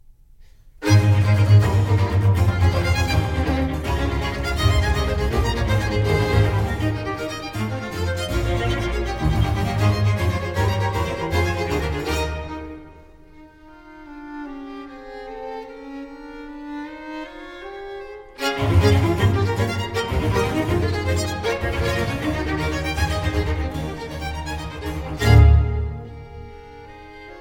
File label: concerto for cello strings and basso continuo in a major allegro; III. Allegro assai